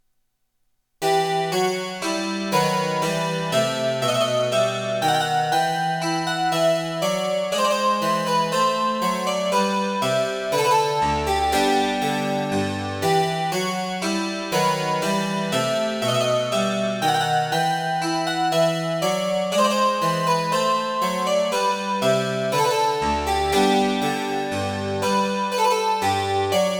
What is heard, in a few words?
Menuet; Six Suites